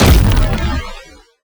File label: invader weapon scifi explosion; Bluezone Corporation